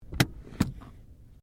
Car Ford Focus Handbreak Off; Ford Focus hire car; Cars; Vehicles; SoundEffects-Foundation1

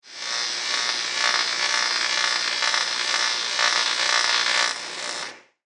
Electric Arc, Electricity, Pulse, Science Fiction; BlastWave

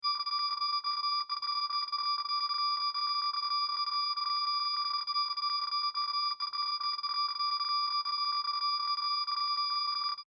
SciFi Scanner, Sci-Fi Scanner Sound Effect; Communication Sound Effects

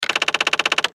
Button Switch Gear Multimedia; Web Interactive; Blastwave; Technology

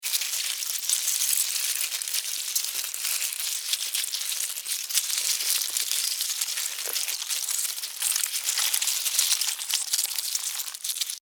Insect Move Wet, Insect, Movement, Wet, Bug; Multiple, Bugs; Blastwave; Animals:Insects

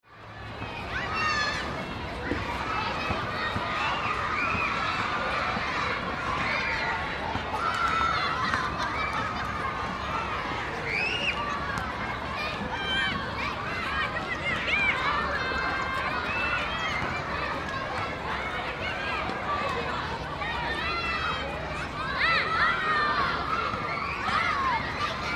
Playground Children, Ambience; Playgrounds (Sound Effects:Backgrounds); BlastWave

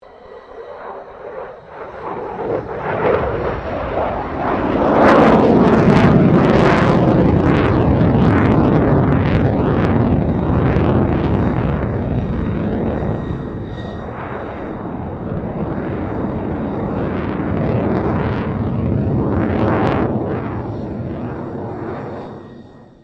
2009 FA-18E SUPERHORNET MANEAUVERING; RAAF FA-18E Super Hornet; Airplanes

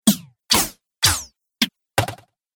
Percussive Hits, Sci Fi

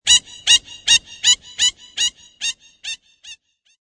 Duck quacking repeatedly as it flies away; Ducks; Animals; LynneMusic

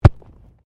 Body movement Organic Impact Heavy; Body movement foley to simulate falls and deaths; Wrestling And Struggling Sound Effects

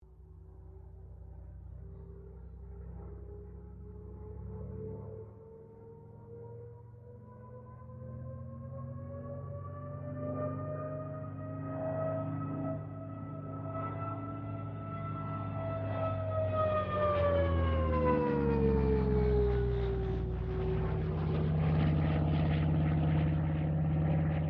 AIRCRAFT CA-13 BOOMERANG PASS STEREO

